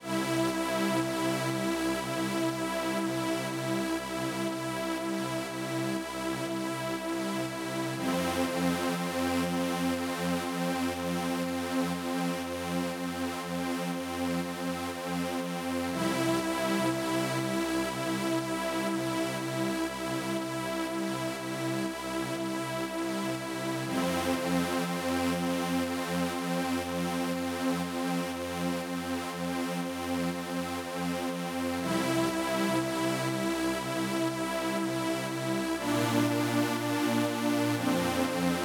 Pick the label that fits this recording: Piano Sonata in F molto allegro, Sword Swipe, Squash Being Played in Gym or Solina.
Solina